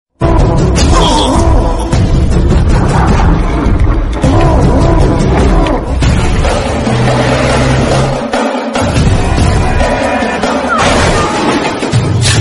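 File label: Villain background music, ringtone; Uppena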